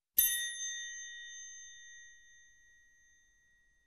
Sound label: Reception Bell